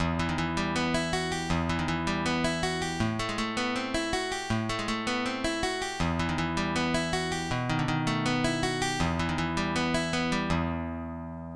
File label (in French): gamme de mi mineur; Jacques Bosch